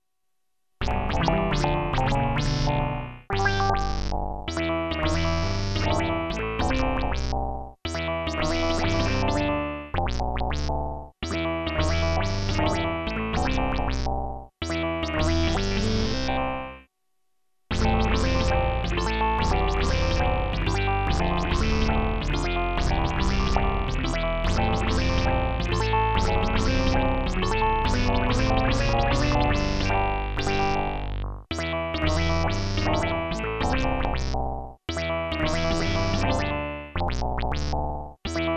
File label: LabVIEW Synth; SMB